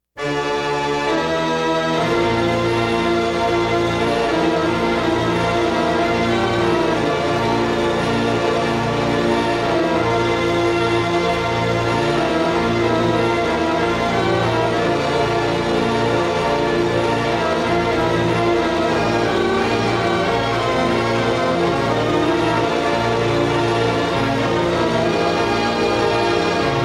Overture Instrumental

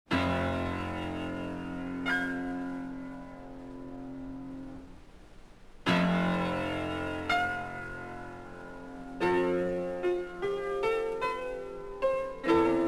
Piano

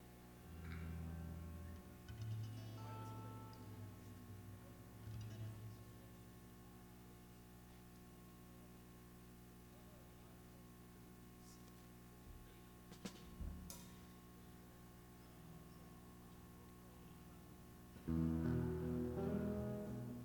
Tuning

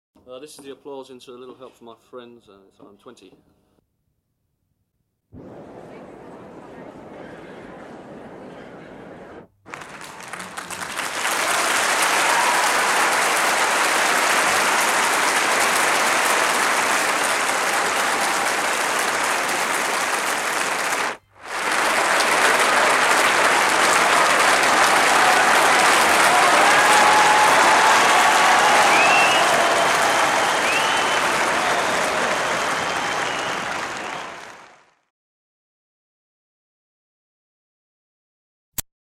Applause

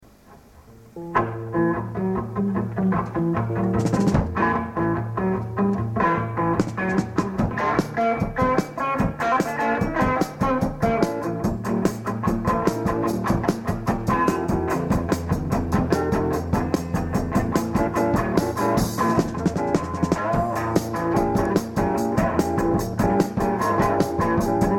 Improvisation

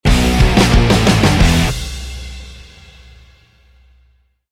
Heavy Ending; elements

